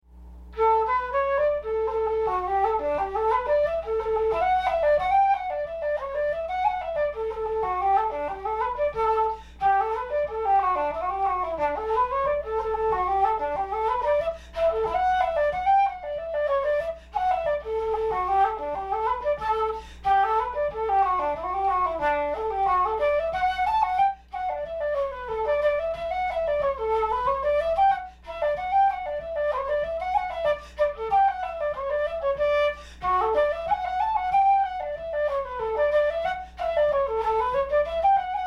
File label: SOLO flute